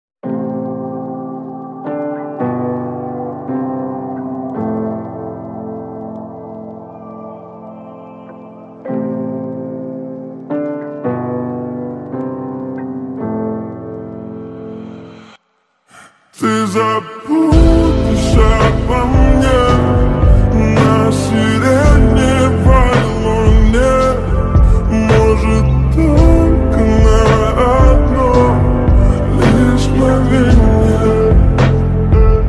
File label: slowed reverb version